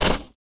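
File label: footstep Dirt; droids robots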